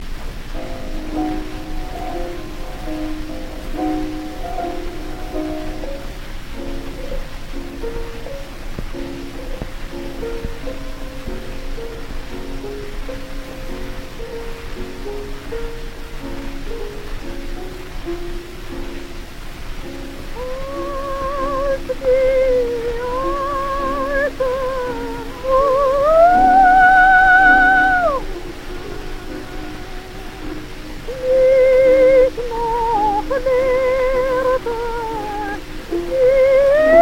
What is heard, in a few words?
Gramophone